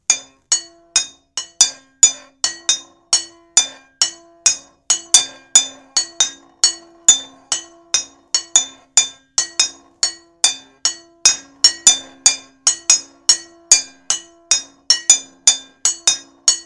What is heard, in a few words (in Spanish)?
vidriofono